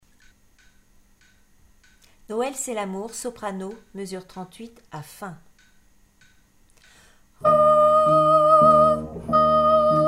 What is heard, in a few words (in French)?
soprano